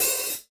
airy open high hat a sharp key, Royality free open hat sample tuned to the A# note